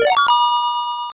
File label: Unused Jingle; Kids Communication Pico